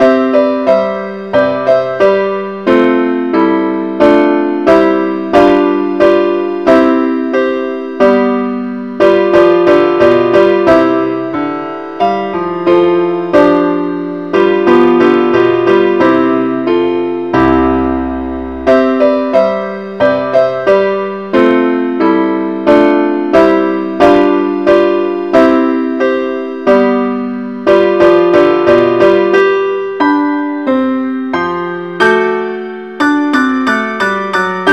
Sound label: keyboard Only key c; Welsh tune; Gwalchmai is a Welsh tune, named for the town of Gwalchmai